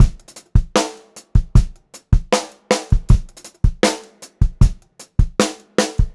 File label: Funk-Groove Drumloops, 78 STRVAR; USB Soundscan vol.38; AKAI